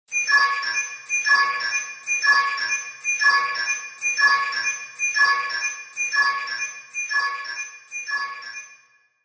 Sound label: Space Incoming Communication